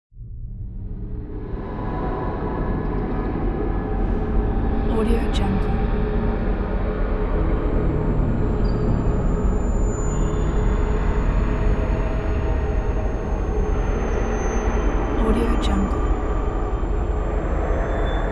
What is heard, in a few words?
suspense; kipaloops